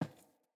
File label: Boot Walk Metal, Footsteps; Characters; Enemy; Boots; Sinecure; Melee Grunt